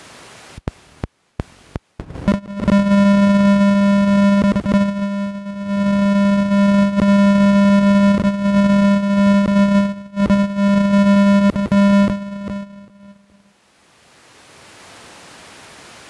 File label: CDMA1x call rev